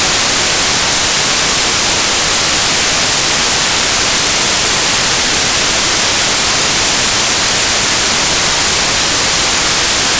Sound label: white rect noise stereo